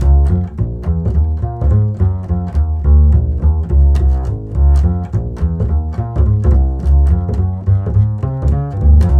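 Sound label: walk C#, 212 brisk walk, bass; Spectrasonics; Bass Legends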